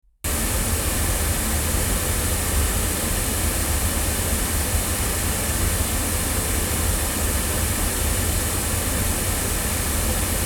Sontronics Helios omni loudspeakers, microphones recordings